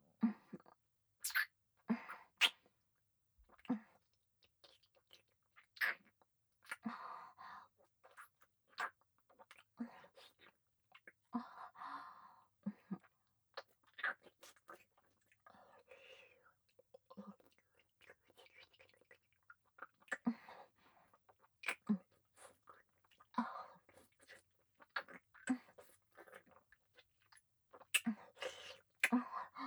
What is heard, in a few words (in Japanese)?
フェラ 普通